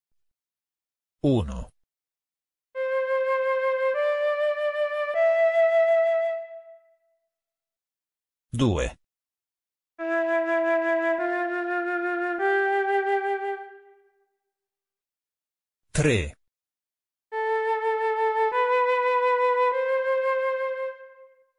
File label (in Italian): esercizio terne di suoni